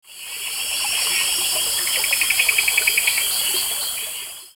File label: Boophis Reticulatus